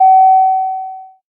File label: Bell